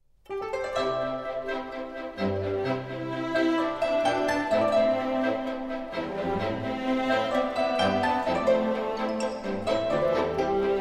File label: The Exquisite Night Chimes; genshin impact website music android ios ps5 windows gamerip 2020